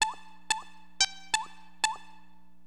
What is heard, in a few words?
blips, keyboards; HipHop; LiveAct Pool 1